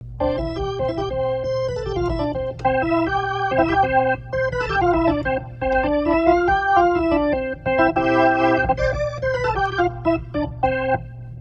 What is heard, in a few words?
organ Auto Fast Slow Rotary; Rotary Mod